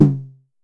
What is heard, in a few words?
Drum X Tom, Pearl